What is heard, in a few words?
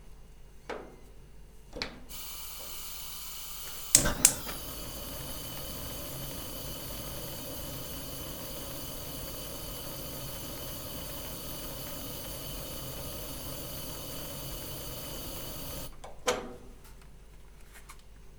Kitchen lighting the gas